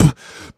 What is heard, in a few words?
Beatbox Percussive, Human Beatbox; Vengeance Samples; Special Sounds